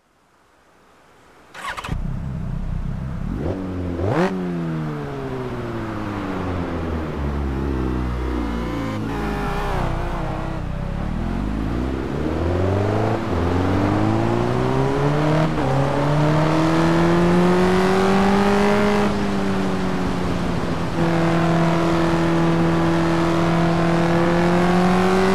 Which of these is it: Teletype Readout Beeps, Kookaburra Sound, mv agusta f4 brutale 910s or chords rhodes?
mv agusta f4 brutale 910s